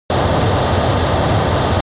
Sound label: transportation jet plane turbine steady